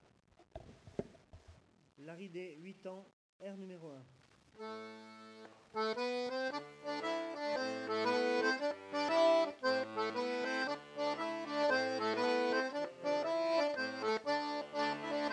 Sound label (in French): Laride 8 temps Theme